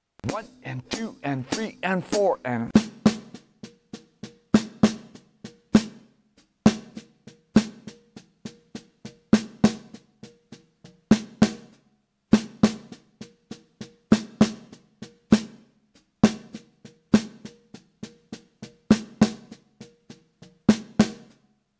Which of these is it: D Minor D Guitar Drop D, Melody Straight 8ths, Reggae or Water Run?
Melody Straight 8ths